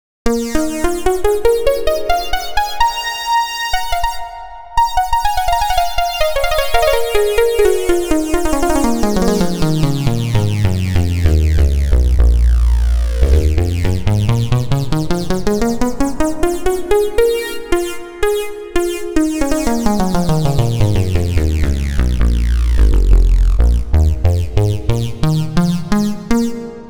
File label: black lead; the space soundset